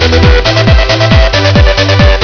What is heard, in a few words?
music